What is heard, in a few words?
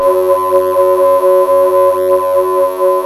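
synth Techno bell; Best Service Gigapack 1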